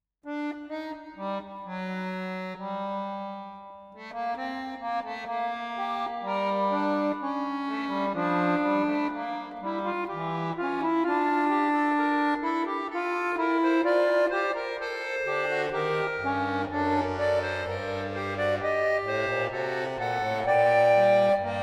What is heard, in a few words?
Fugue in G minor